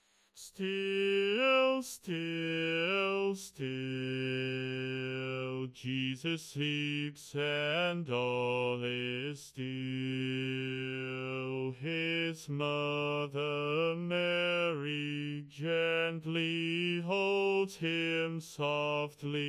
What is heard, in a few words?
Bass only; Still Still Still